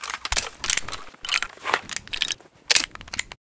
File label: taurus reload one